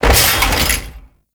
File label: parry finisher fall; Enemies; Warframe; Duviri; Dax